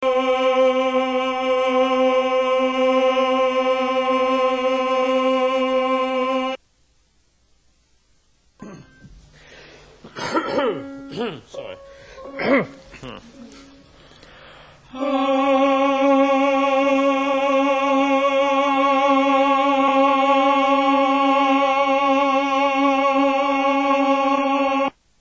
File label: male choir